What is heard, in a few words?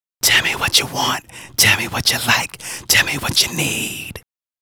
Male Solo, Vocal